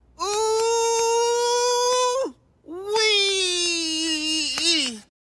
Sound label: OooooWeeeee